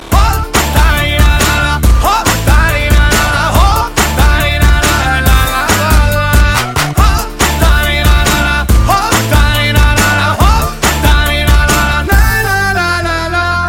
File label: ringtone